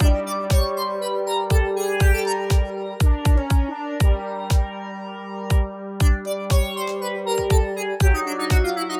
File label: menu, Music; TRORB